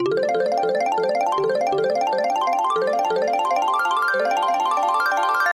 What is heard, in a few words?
big Win Start; twin happiness